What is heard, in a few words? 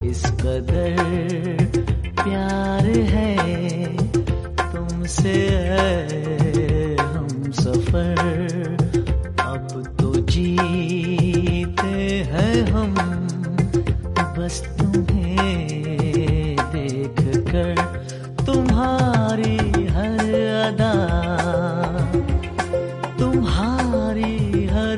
Hindi Song